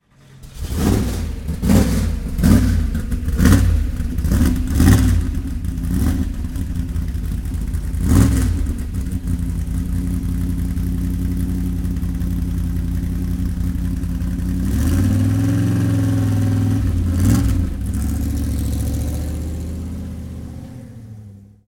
Allard K2

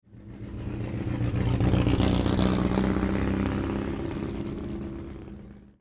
Triumph TR3

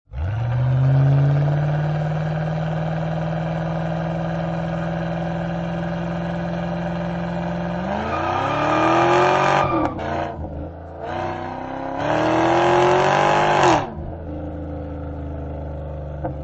pipes burnout

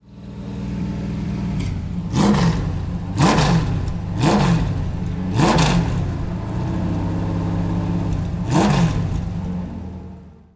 Lola rev, engine; 1974 Lola-Frisbee

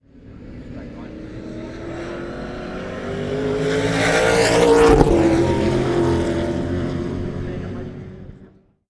Shelby Mustang, engine; 1966

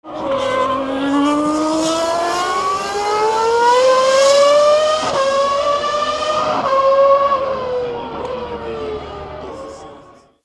F1

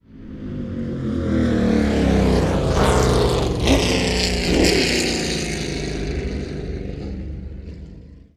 McLaren M6B Lola T163 decel, engine

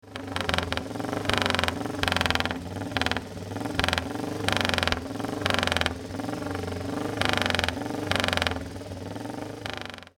Cooper Norton Mk13

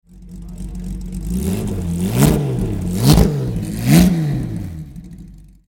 Lister Jaguar

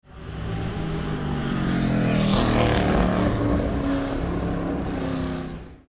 Lola T70 Mk III B, engine

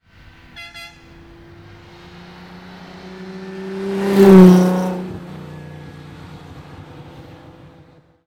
Ferrari Daytona, engine

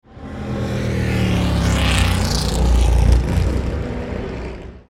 Mclaren M1A

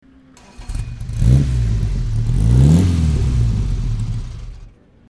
Ferrari 750 Monza

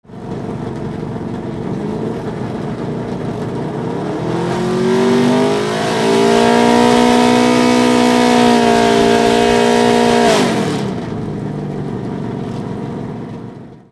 nascar chevy rev

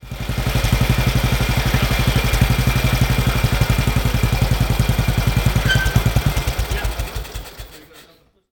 Royal Enfield 350 Model C

